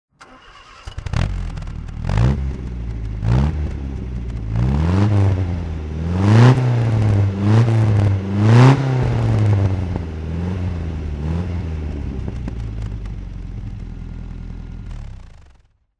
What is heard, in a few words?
mg mga 1600 roadster throttle